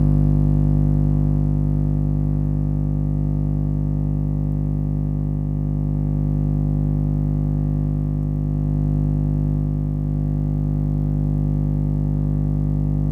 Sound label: Electric motor, Industrial drill, Drone; Electromagnetic; The Electromagnetic Library; Priezor